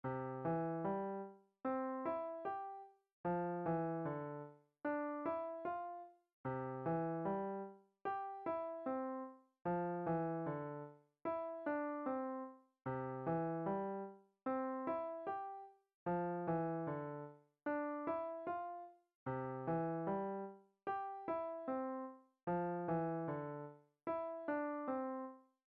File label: Three Note Phrase, piano